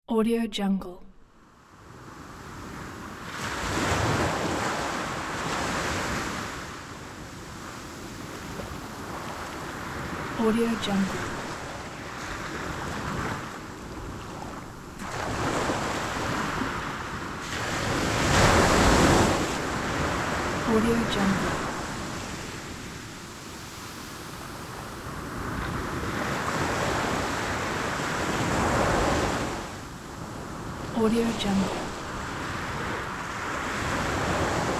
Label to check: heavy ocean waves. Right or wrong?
Right